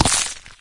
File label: chomp, imp; doom3